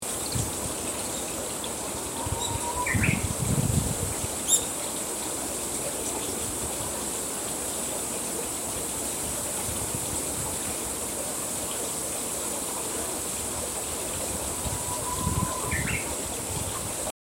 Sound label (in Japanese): ウグイス; 鷲峯から春の便り; 春の声; 鷲峰山